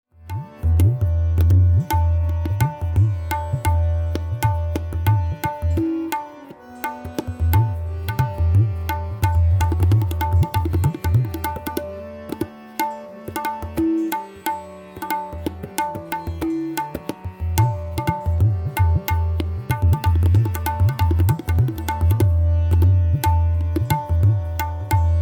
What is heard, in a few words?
peshkar vilambit